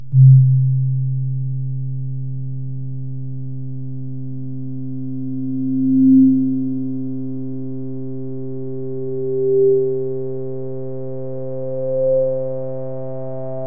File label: electroacoustic filter c